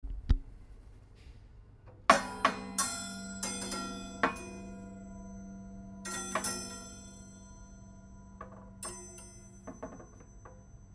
coat hangers song, street recordings; singapore

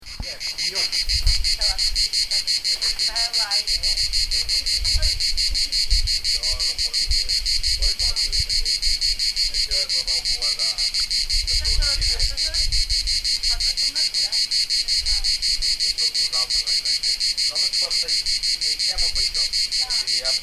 electronic cicadas

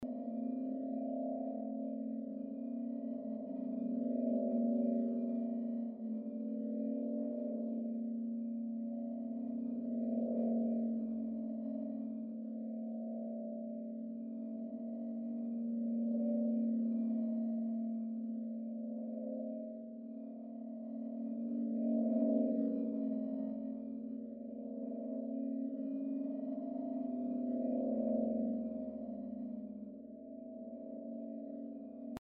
electric gamelan WOLF WIND ROTATING

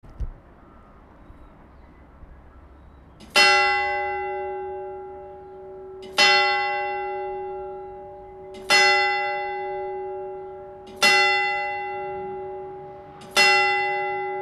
port chalmers bells

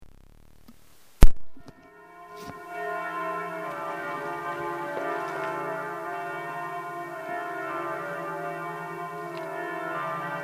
monserrat bells

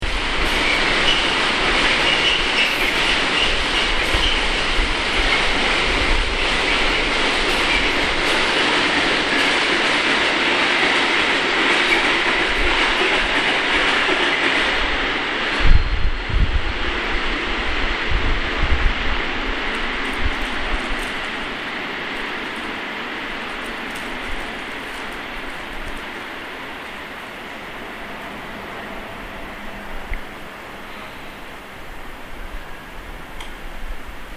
scraping and train noise

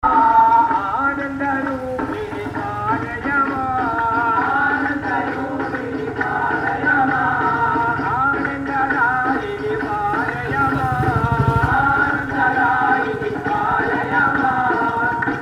hindu chants, South India street recordings

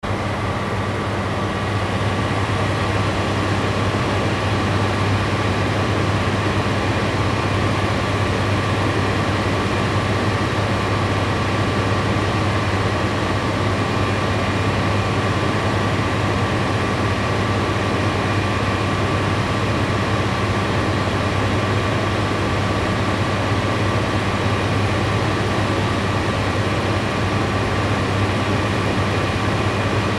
Morning engines, North India

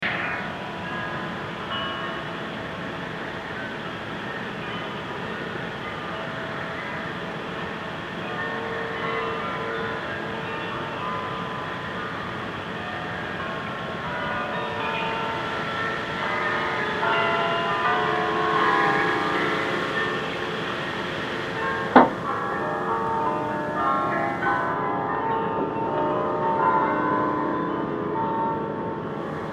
shrine dictorphone mexico, street recording

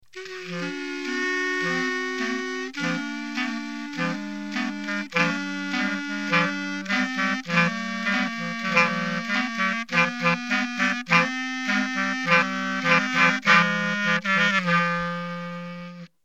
clarinet

